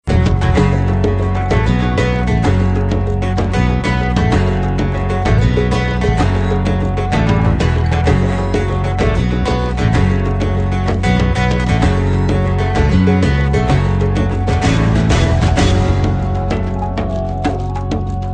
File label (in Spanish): guitarra